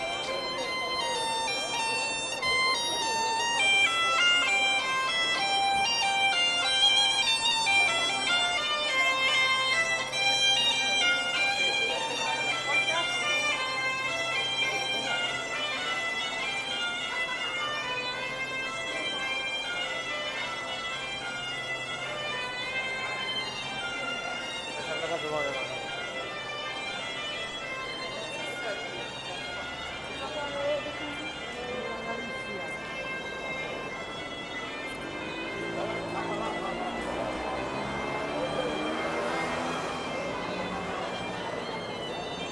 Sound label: Piper